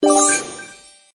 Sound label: prop coin; BingoGrassland